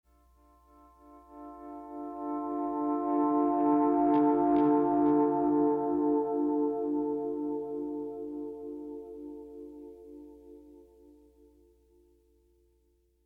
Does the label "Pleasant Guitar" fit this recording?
Yes